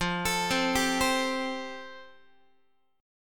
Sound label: F Major Add 9th, Guitar; Fadd9 arpeggiated; JGuitar